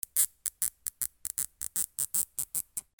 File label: HOUSE